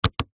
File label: vehicles car rear wiper switch click; hyundai trajet 2006